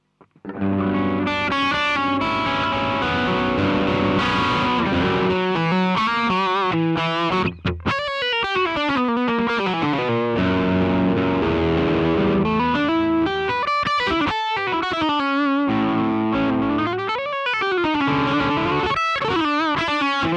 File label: SH14 Custom 5 drive